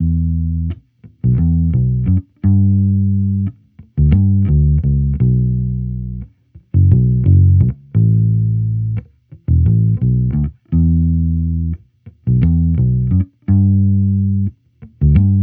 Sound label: Amped loop 87 Cm, Electric Bass Loops; Slate Digital BASSHOLE Sample Pack